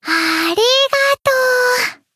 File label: Chant Arigato